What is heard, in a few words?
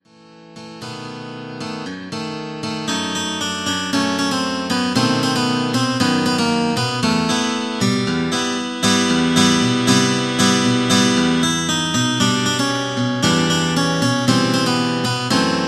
synth